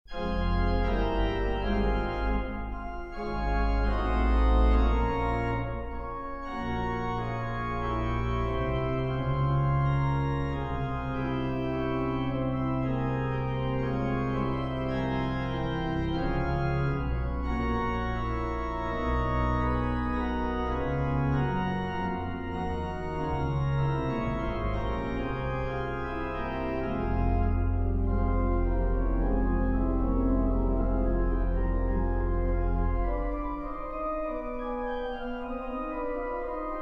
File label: organ sonata no 1 in f minor Allegro; Mendelssohn